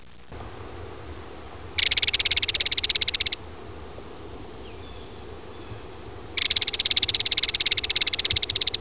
Belted Kingfisher

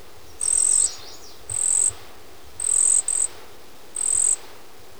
cedar waxwing

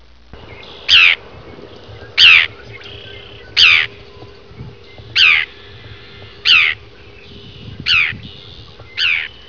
forsters tern

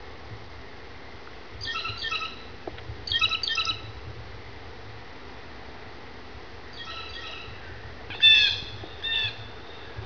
bluejay

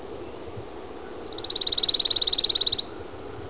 Pine Warbler

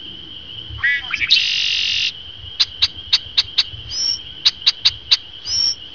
Red-winged Blackbird

